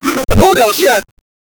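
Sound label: sticking something into the, earth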